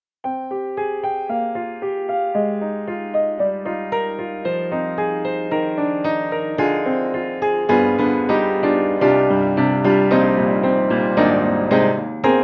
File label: Upright Piano Player Midnight Jazz; Steinberg; The Grand 3